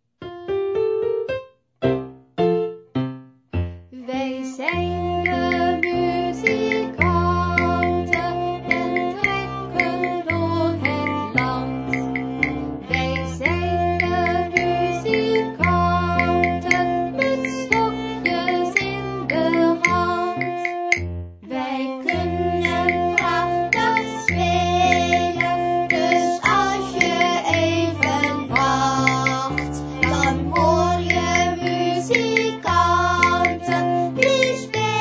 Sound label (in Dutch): muzika